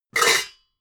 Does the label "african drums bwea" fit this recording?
No